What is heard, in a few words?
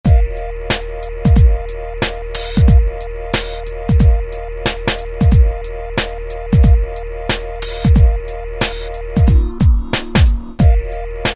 kit; E-mu PK-6